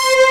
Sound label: Opening loop; SNES; Dragon Ball Z Super Gokuden - Totsugeki-Hen